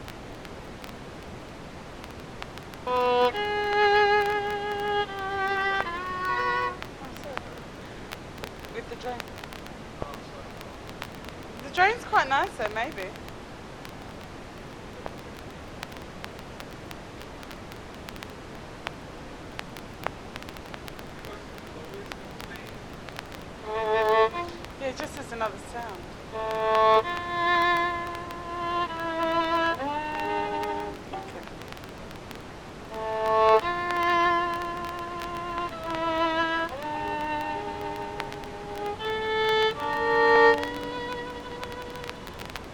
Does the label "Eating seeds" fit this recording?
No